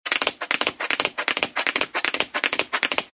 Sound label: tap dance wing step